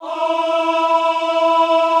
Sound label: AHH E3, Extended Classical Choir; Best Service